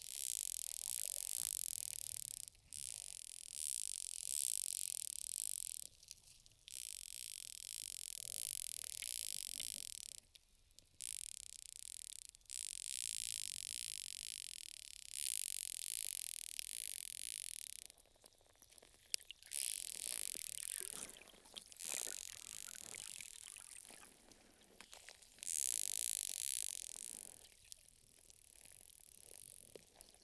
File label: Tursiops truncatus; MonkeyMia 2013